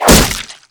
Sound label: arrow impact flesh; weapons